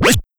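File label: Sound FX DJ Scratch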